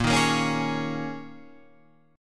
G sharp Suspended 2nd Suspended 4th, guitar chord

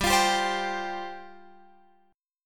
G 7th Suspended 4th G down Guitar Open F; Listen to G7sus4 strummed; G7sus4 Chord

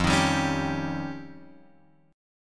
F 7th Suspended 2nd Sharp 5th

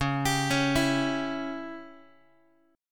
Db Diminished, Guitar; arpeggiated; JGuitar

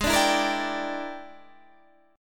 E 7th Sharp 9th Flat 5th G sharp, chord

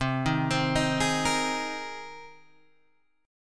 C Minor 7th Sharp 5th C down Guitar Open G

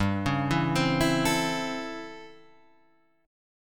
A 7th Sharp 9th

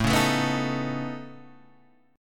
C sharp 7th Suspended 2nd Sharp 5th A

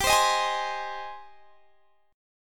G sharp Major 7th G

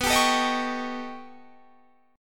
B Augmented 9th B, chord

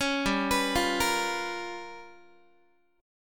G 7th Sharp 9th Flat 5th, Guitar; G7#9b5 arpeggiated; JGuitar

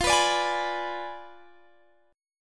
D Diminished 7th F

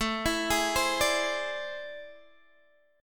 A Minor Major 11th A, Guitar, DADGAD

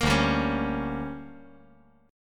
D Major 7th Suspended 4th Sharp 5th

